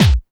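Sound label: snare drum bass drum, Dance Mega Drums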